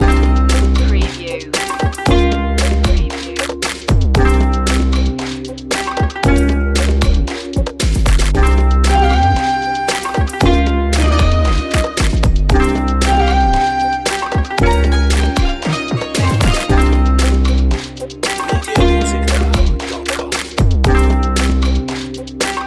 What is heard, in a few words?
desert market loop